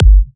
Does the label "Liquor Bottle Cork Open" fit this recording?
No